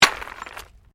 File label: Digging Pick Stones